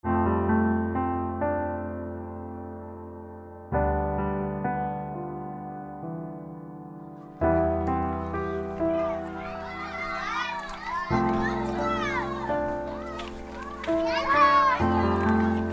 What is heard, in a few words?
refugees children voices music; refugees on the run